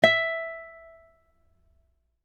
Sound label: dial tone guitar star; Oppo R7